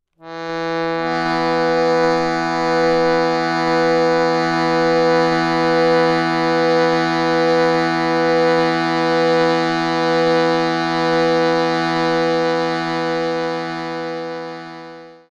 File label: Shruti Drone E B